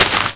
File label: grass, player; mistake coop